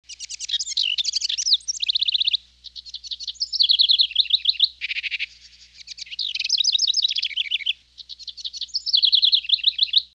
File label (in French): oiseau